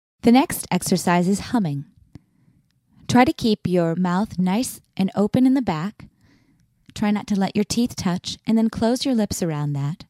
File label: Humming